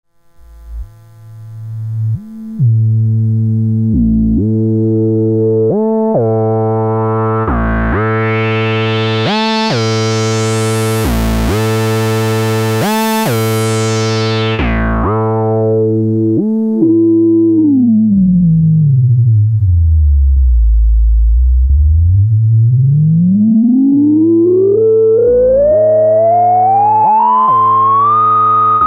Octave Kitten II, VCF; Synths

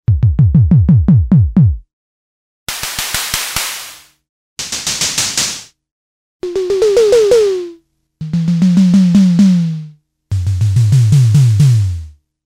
TS-305 Midi Velocity, Tama TS-305; Midi2SDSII

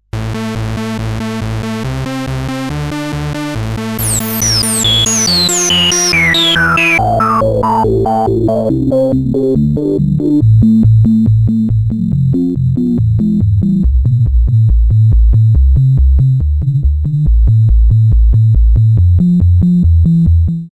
Roland MC-202 VCF

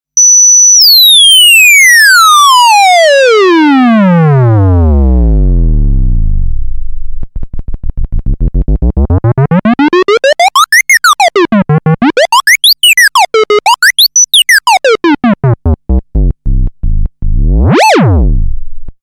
Transcendent 2000 Filter Self Osc

